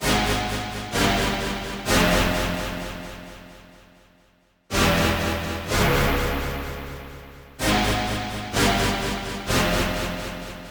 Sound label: rave sequence - fm - 126; synth loops